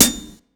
Hat, breakcore Drum Hits

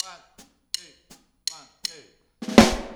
Take Your Toms Off Intro, Drums; Sonic Foundry (Sony Creative Software); Drums from the Big Room The Mixes; Demo Projects

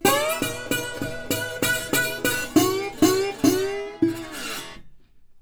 Blues Guitar Samples; ILIO - Fretworks

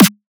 drum snare smol; Virtual Riot; Drum Hits

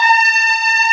BULGR D#6, BULGAR VOX; East West; Global Sounds

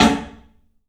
club snare, 3D Drums; 3D Sound

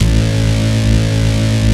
STICK; Vintage

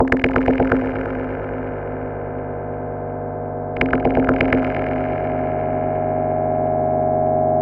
loop, techno atmos

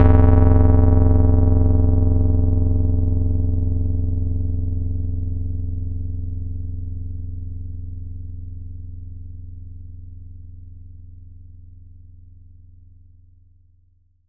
BASS SH09 F0, Synths, Roland SH-09, Bass

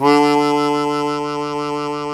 HARMONICA

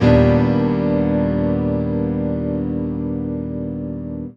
dark piano chord; Sonic Foundry (Sony Creative Software); Ugly Remnants VOL-2

